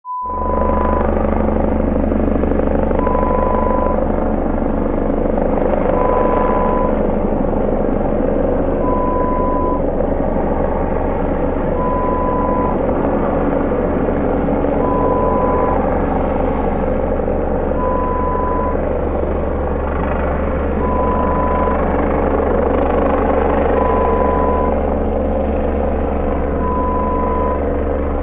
Helicopter sound

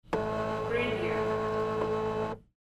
fax paper feed

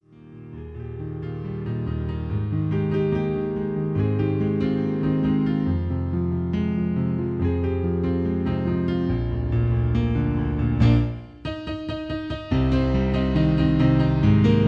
My Piano Version

